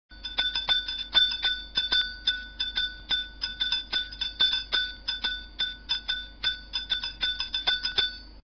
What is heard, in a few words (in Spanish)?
hierros; tradiberia